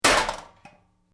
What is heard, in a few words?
Metal Debris Impact Short Medium; Small metal junk crash